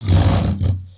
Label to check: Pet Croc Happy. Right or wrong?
Right